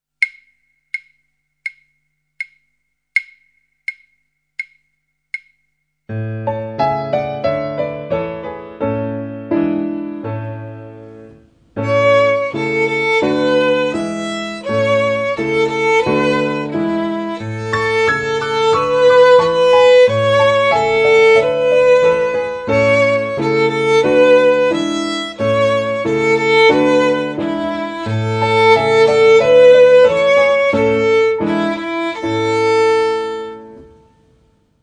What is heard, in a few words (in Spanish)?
Abadía de Westminster violín